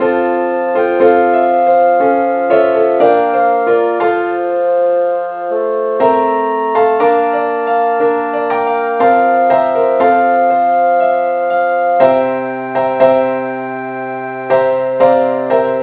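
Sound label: keyboard key B flat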